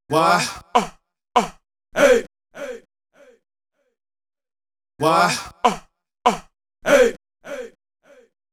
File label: Vocal Accents; Sonic Foundry (Sony Creative Software); Club Joints and Street Anthems